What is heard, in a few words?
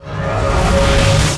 iron burn cast; fighter